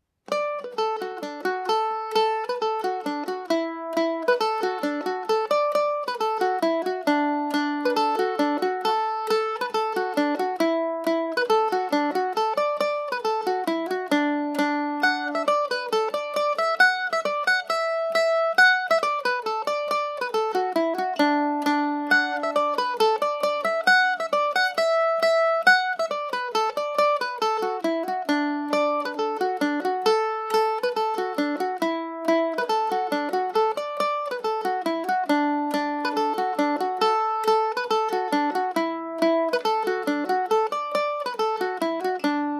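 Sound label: D major, Irish mandolin; cashmans favourite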